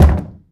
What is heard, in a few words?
underground cube soft